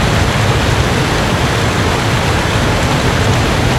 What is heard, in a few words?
waterfall; ambient